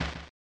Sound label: Goalie Catches Ball